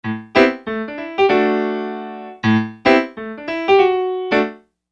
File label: Acoustic Piano